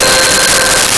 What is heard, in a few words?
Metalic Pad C5; Nintendo; July 2020 Gigaleak